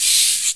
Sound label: TREE BELLS; E-MU Formula 4000 Series Vol. 2; Dance Set 2